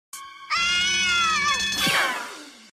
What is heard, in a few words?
screaming; wubbzy